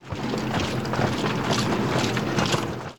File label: troops truck; Opartofwar